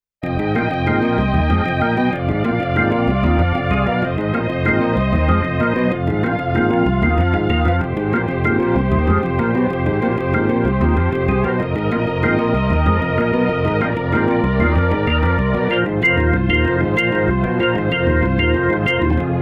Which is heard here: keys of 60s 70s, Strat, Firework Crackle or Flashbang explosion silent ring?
keys of 60s 70s